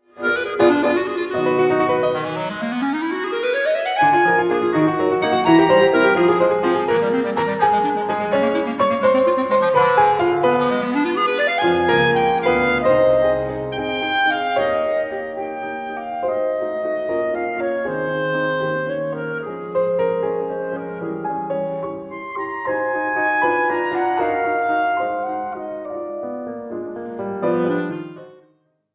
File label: sonata in e flat major op 167 for clarinet and piano 1st movement; saint saens camille; allegretto